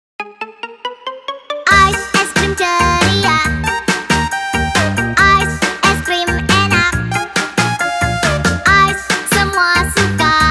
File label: JINGLE